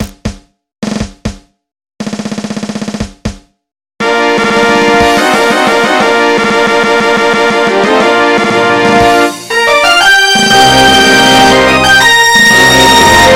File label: Fanfare